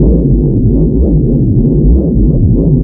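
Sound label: painkiller loop; Call of Duty Modern Warfare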